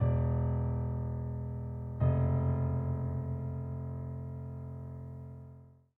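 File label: Theme F minor E F; DeadFright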